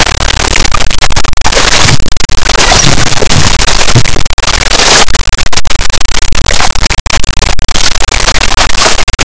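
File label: realistic sound of a large, reptile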